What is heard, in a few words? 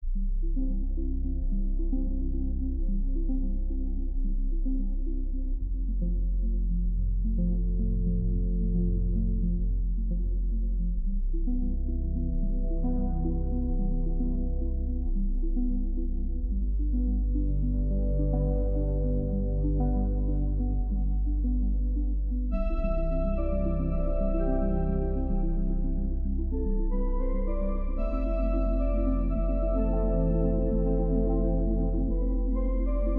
Electronic; Anomaly